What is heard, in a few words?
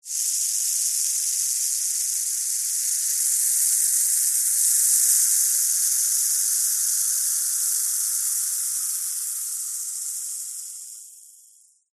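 Champagne Fizz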